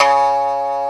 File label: SYAMI C1#, SHAMISEN; AKAI S6000 CD-ROM - Volume 1